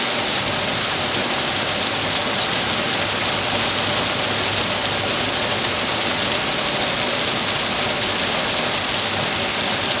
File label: rain, Effects; A320; J-Maverick 16's sound work